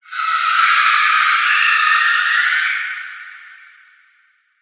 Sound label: scream shriek sound design several overlapping reverb; Skyclad Sound